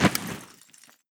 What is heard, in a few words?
button hologram